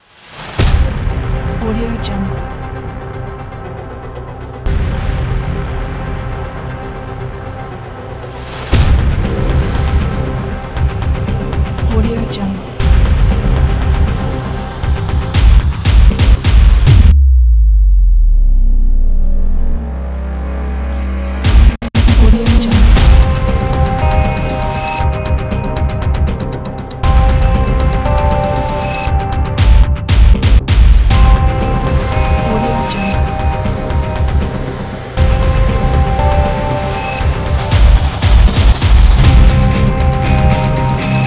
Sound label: aggressive trailer teaser